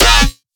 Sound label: Riddim Bass Hit D# Cry; One Shots; Ghosthack; Riddim Essentials 2020